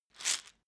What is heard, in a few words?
pillbox shake